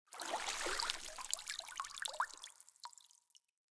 Water Shallow Stop Drips; Entity Contact Movement

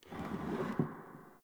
Bar Wooden Chair Move; Warframe; Nineteen Ninety Nine; Hub; Hub Pub

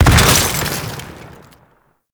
Micro Death Explosion; Warframe; Corpus; Arachnoid Micro

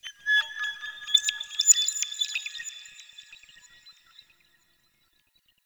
Crystal Bird; Warframe; Tau

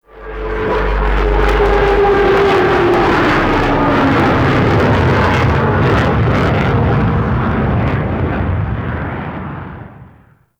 Ship Spawn, Dropships; TheTeacher

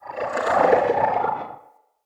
Corrupted Slug Vocal Idle

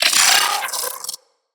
Arachnoid Micro Vocal Pain; Enemies; Corpus

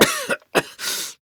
Coughing; PTSD; Warframe; Evacuation

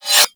Tonfa Blade Spin; Warframe; Tenno

